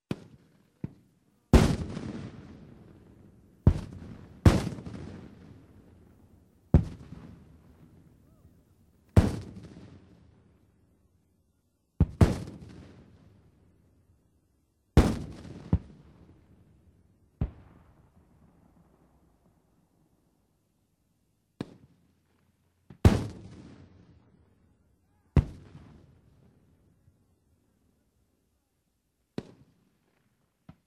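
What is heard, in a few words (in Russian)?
артиллерия перестрелка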